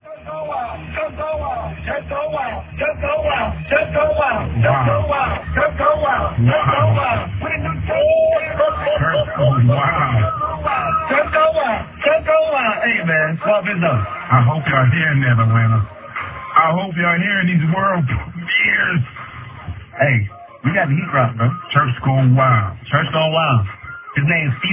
Interlude

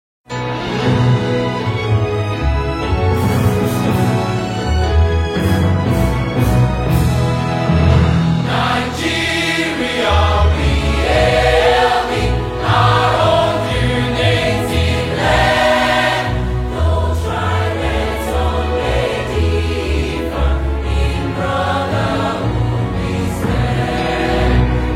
Choir, The National Anthem

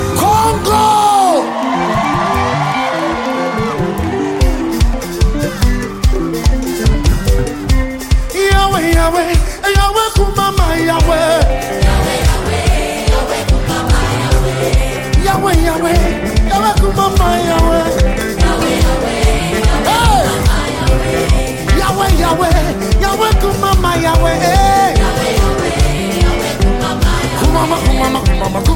Afro Praise

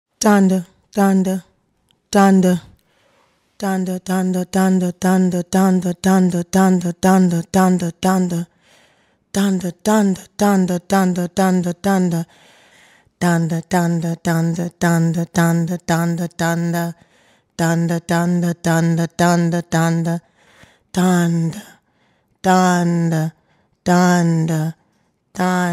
Chant

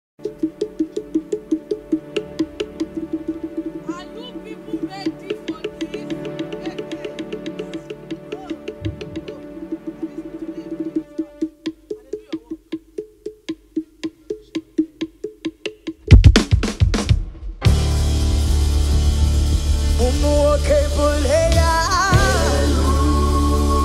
Nigerian Dialects Praise Medley

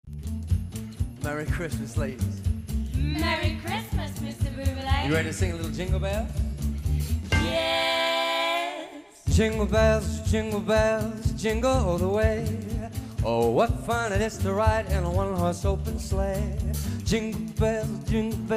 Jingle Bells

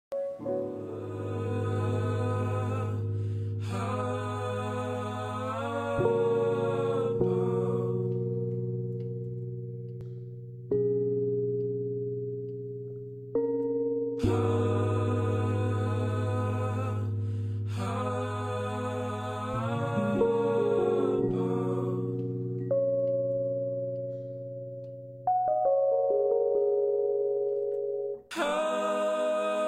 Acapella Outro

